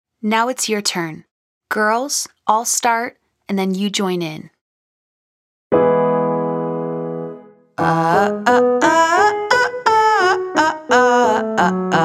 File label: Staccato Legato Edgy Uh Long Scale Female Version